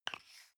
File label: toothpaste screw cup close medium single; haus-garten; badezimmer